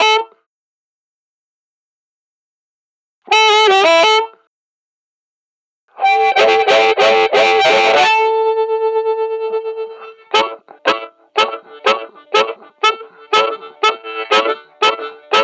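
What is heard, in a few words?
Blues Harp Breakdown; Digidesign; Pro Tools Essential Loops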